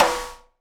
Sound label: SNARE RIM, Live Snares